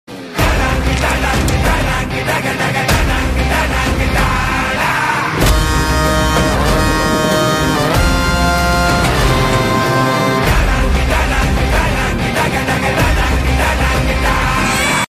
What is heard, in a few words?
michael music bgm ringtone; michael movie